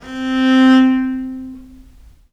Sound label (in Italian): C3, Historical Instruments, VIOLE MUT; USB Soundscan vol.23; AKAI